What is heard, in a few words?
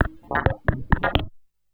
percussion loops, Techno, 138